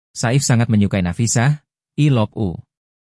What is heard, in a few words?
music